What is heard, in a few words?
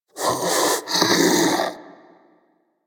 Armadillo Vocal Breathe; Warframe; Hunting Critters